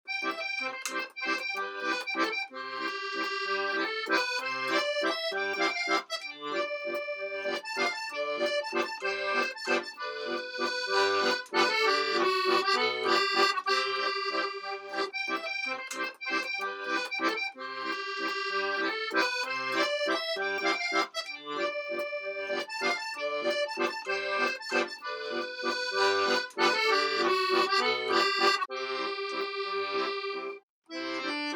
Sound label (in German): SOPRANI, akkordeon; Paolo